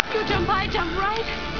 jump

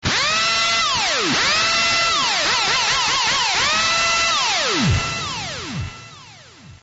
Drill

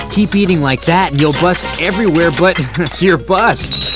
eating

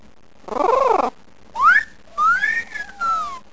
whistle

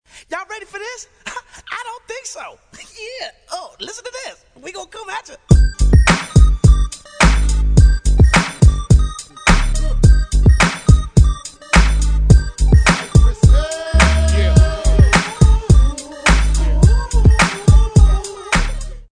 Entry Music; wrestling

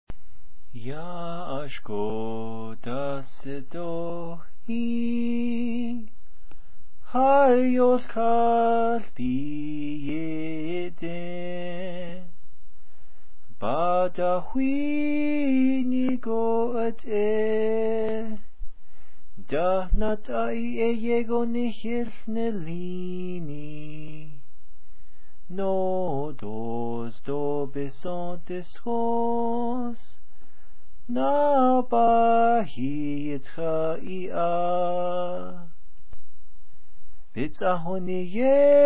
star spangled banner sung

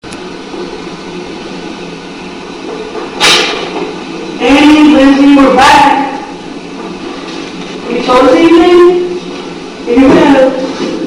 shattered glass, Glass Bunny Breaking; Electronic Voice Phenomenon (EVP's)

